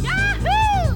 Empty Recycling Bin

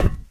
metal, footsteps run; Left 4 Dead 2

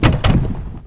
drag drop; AssaultCube; Alien Stars; American Muscle Series

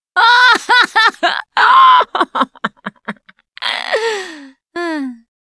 laughter

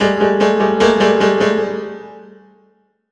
jockey bacteria, music; Left 4 Dead 2

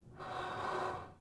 Gasmask Inhale; Sid Meier's Civilization 4 Complete; Beyond the Sword; Units

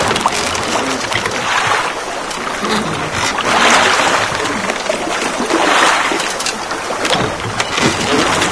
dock close loop, ambient; spacial loops

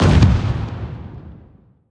light armour fire; Hearts of Iron IV Death or Dishonor

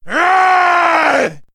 man yell; Mount&Blade Warband

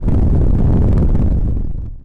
carrier run; Sid Meier's Civilization 4 Complete; Beyond the Sword; Final Frontier; Units

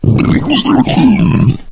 male boomer alert; voice; Left 4 Dead 2

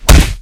body medium impact hard; physics; Garry's Mod; hl2

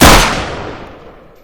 fiveseven; Counter-Strike Global Offensive

